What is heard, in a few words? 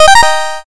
win